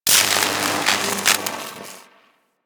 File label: Spark; Warframe; Ambience; Corpus